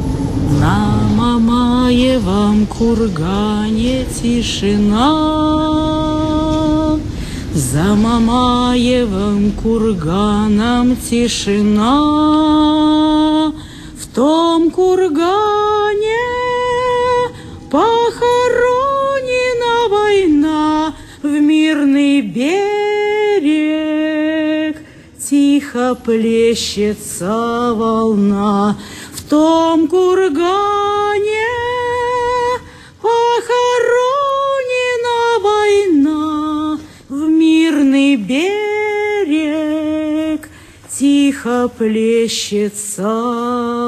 Chant russe

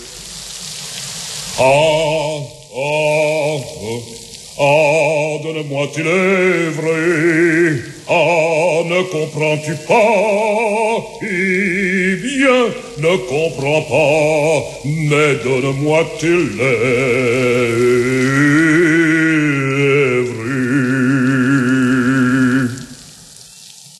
1er baiser chanson

